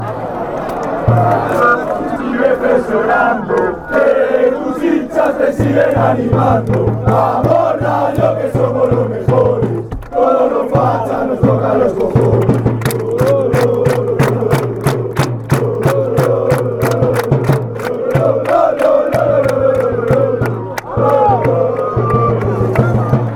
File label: best, fan chants